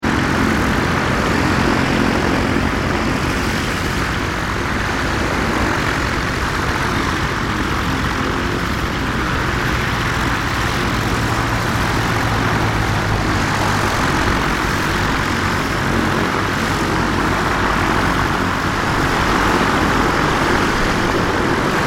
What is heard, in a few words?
ambience airfield prop runway; Airfield / Small Airport Ambience - Light Aircraft Queue Up To Takeoff; Backgrounds; Airports; McKinney Sound